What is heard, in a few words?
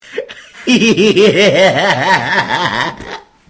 goofy laugh